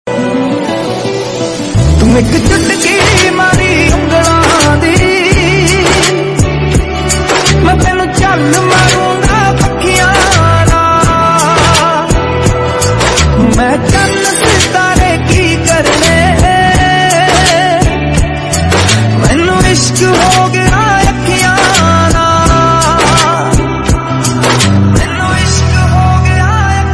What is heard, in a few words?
Punjabi Song Ringtone